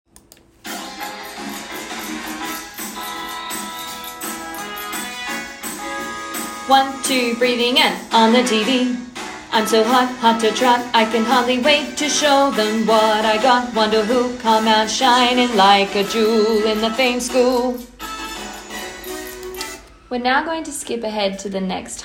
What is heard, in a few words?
Bass; Fame The Musical - Holiday Pack